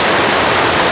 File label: wave; Nintendo; July 2020 Gigaleak